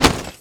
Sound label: Item armor drop; 100rogues